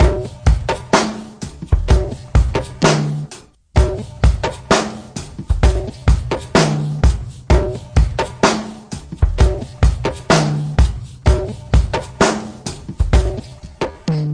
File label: clown conga, drum loops and breaks, 064 bpm; 000 to 080 bpm